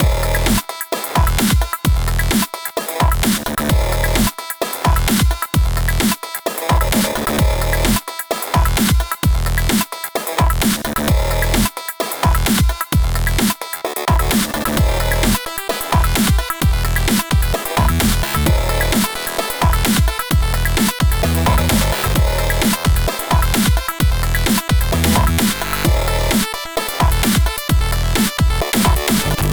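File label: Music Stinger Win Loop; jetpack fighter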